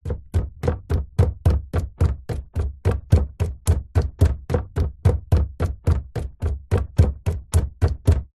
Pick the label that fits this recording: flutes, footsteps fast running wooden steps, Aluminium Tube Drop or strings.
footsteps fast running wooden steps